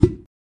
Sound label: pop cork